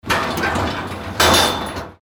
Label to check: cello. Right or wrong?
Wrong